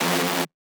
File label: synth screech oneshot F dry; Synth Hits; GhostHack